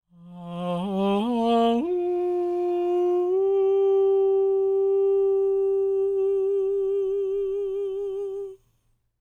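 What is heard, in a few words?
falsetto, Vocal Planet Jazz FX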